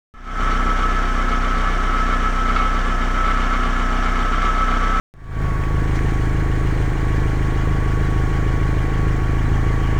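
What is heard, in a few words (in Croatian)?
u leru, Toyota MR2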